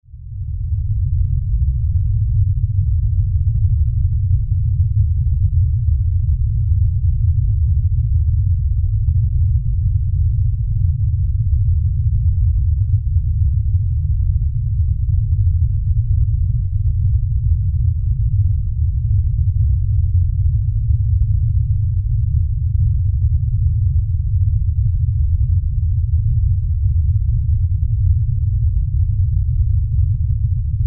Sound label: Impact Lfe Rumble; Impact Lfe Low Rumble Bass Long; Low Impacts (Sound Effects:Impacts); Blastwave